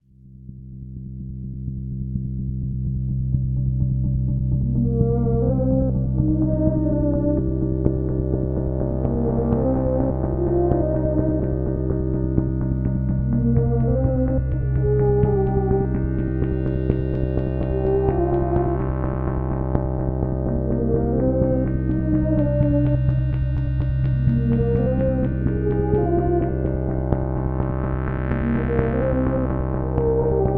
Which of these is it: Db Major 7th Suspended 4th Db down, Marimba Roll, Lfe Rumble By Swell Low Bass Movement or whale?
Marimba Roll